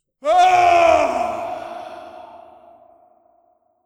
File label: groan; sound effects and sound reinforcement; The Passion of Dracula